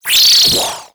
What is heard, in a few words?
Multimedia Internet CD-Rom Flash Wipe Electronic